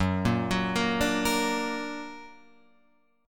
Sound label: F sharp Augmented F sharp Guitar Open F; arpeggiated; JGuitar